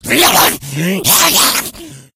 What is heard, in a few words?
rage, infected, male